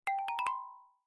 Pure, notifications; BQ (Russia); BQ-4072-Strike-Mini

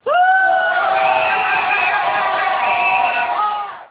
cheering; Siemens CX65V SFR

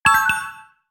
Fish, notifications; Xiaomi Black Shark

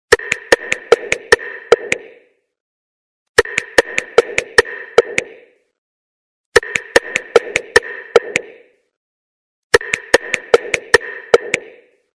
Ringtone; LG; KF900-Prada-II

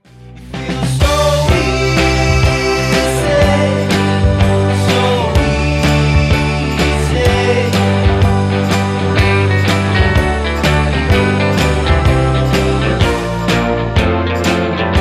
Melody, Ring; Doro PhoneEasy 626

